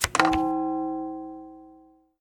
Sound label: discarded; crazyeights